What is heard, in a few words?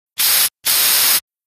Aerosol spray various, aerosol can; FOLEY PROPS AEROSOL CAN: INT: Aerosol spray various.